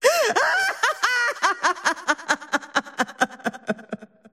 laugh; dota; drowranger

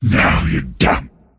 deny pain; pudge

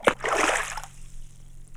water splash small; body; physics

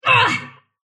sylph pain; dota; dark willow

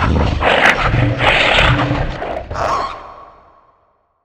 gobble candy; weapons; dota; creep; roshan

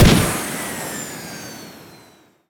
jetpack end; weapons; dota; rattletrap

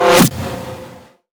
deadshot impact; weapons; dota; muerta

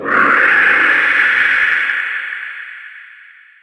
fear target; weapons; dota; dark willow

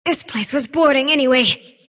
sylph move pain; dota; dark willow

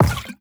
jug drop; ui; inventory

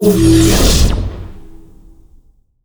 surge; weapons; dota; dark seer

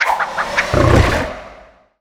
whip channel; weapons; dota; ringmaster

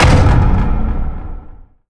matchmaking find; ui

